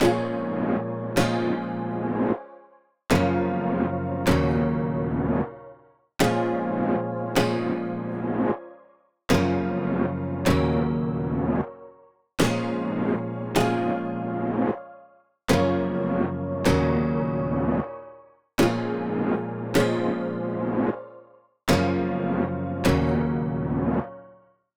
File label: 155 BPM A# Min Chords; Melodies; Loop Stems; Cymatics; Celsius; PHARAOH Beta Pack; Pharaoh Premium Drum Samples (BETA)